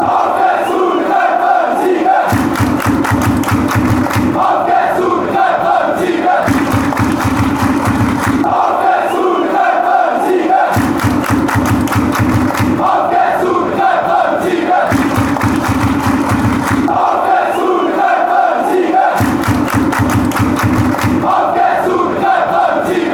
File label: come and win, chants